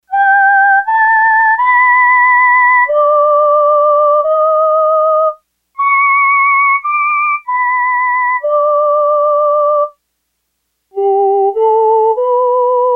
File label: LadyVox, Yamaha TX802; Factory Patches; Voices